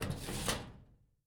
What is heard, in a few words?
filer open; Papers Please